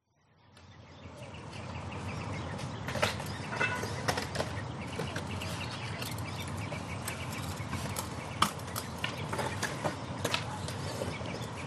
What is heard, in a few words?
skate park amb skateboards birds traffic in bg